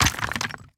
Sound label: Drinking Fountain; gamerip